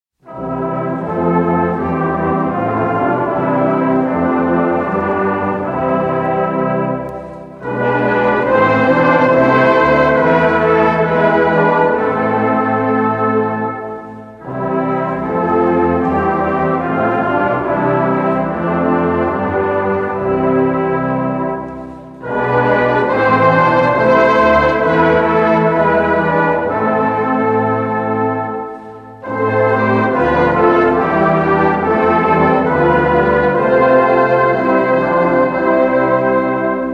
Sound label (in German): O Haupt voll Blut und Wunden Instrumental Blasmusik